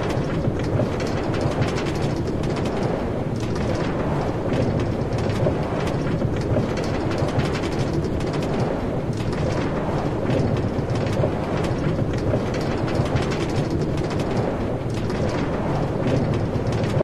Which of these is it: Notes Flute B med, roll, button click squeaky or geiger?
roll